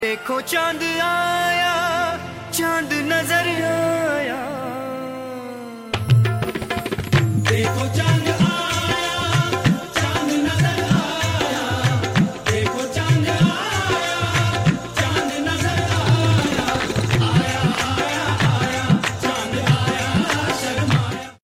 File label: Ringtone